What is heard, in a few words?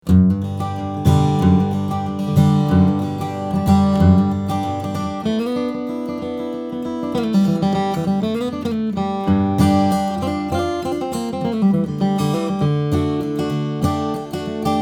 Blazer & Henkes D-18 Coleman Mahogany/German, steel string guitars flattop; a special find from master craftsmen Blazer and Henkes